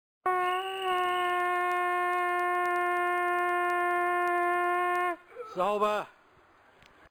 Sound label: horn